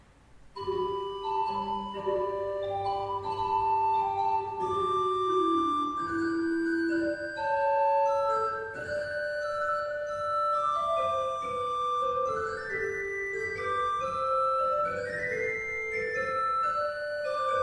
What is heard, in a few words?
Variation 7 Organ Version; Bach; BWV988